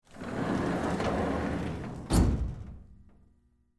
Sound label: Cabinet Drawer Open, Metal; Theatre, Gels; BlastWave